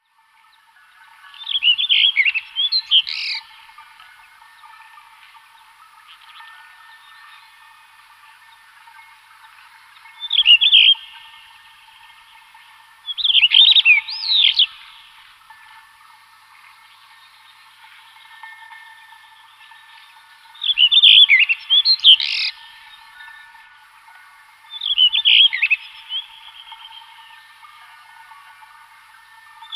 Blue Rock-Thrush song

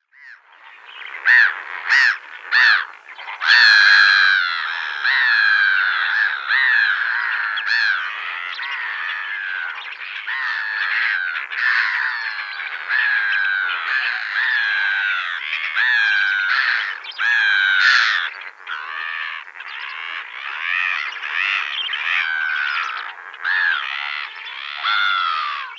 Grey-hooded Gull

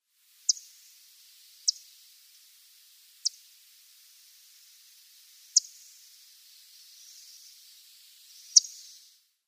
Blackpoll Warbler calls

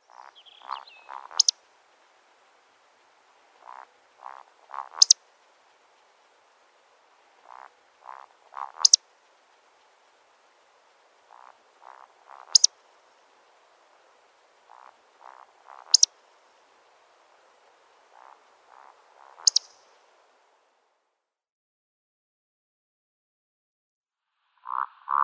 Eurasian Woodcock male display flight

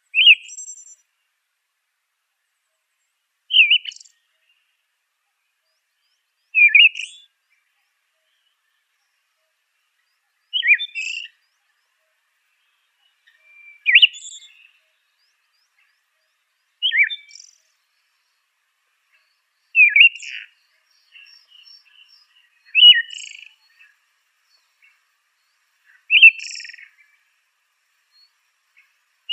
Siberian Thrush song

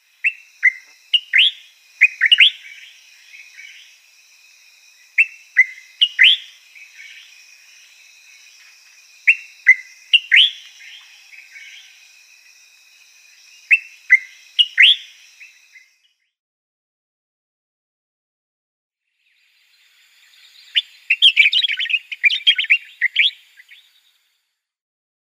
Common Bulbul song

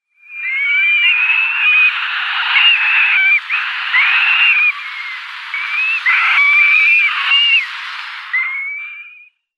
Eurasian Curlew downy Young

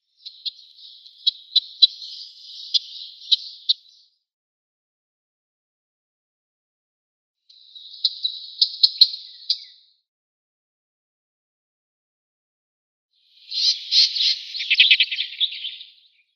Western Olivaceous Warbler calls

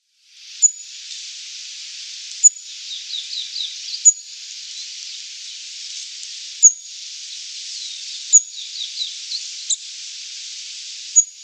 Olive-backed Pipit calls

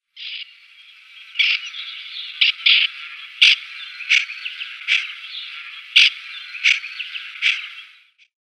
Masked Shrike call

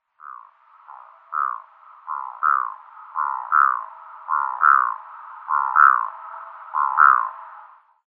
Black throated Diver flight calls

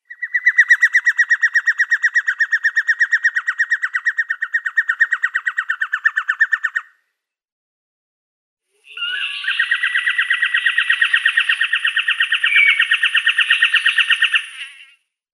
Jacobin Cuckoo display

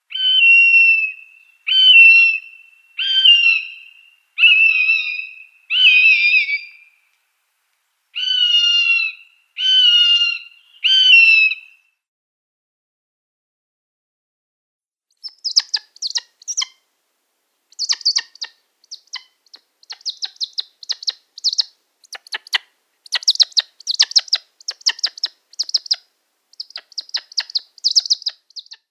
Common Buzzard nestling

